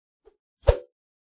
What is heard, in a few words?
meleeattack swoosh light, weapon swooshes; psfx